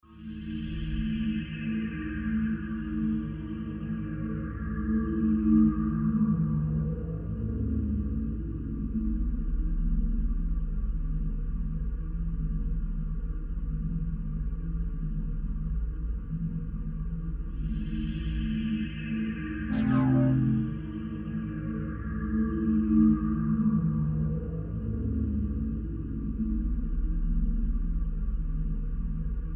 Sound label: Ambience; SMAC Gaian